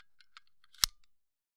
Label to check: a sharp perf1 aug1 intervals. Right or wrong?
Wrong